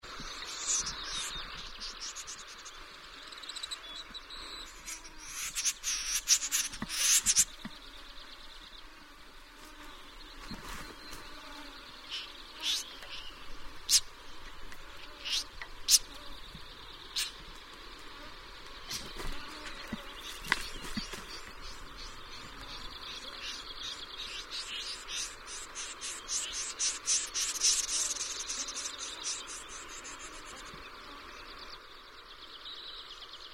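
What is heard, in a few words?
Gallinago stenura; Kahlaajat kurpat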